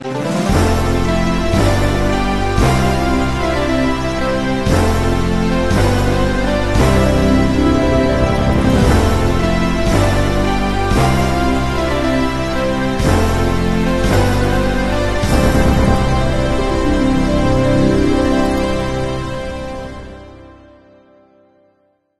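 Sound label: fanfare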